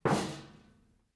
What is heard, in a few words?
Metal Footfall; soundscrate